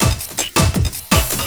LOOP, 162 BPM, Drum & Bass

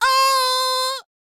D BIT, Vocal R&B Dance

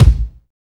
THRASHER K, HIP-HOP K; Drums In Your FACE!

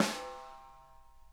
SNARE EDG; Sonic Reality; Interactive Drum Kits

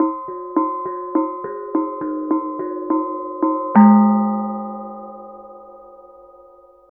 KENONG; Spectrasonics; Heart of Asia; KENONG PH

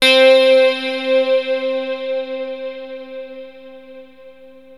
Access Virus B; Synthworx

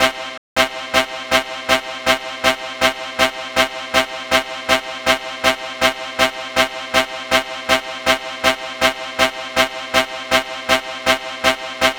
Dance Synths, Hardcore Loops, Synth Loops

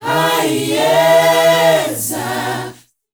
MIX.CHOIR; Spectrasonics; Heart of Africa 2

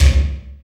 FX Dance

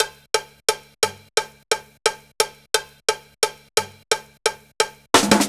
classic dnb breaks, C, 175

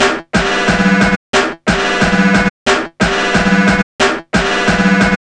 090bpm, Downbeat Dance Grooves

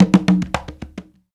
fill, Bembe fills; Bembe 6/8; Spectrasonics - Supreme Beats - World Dance

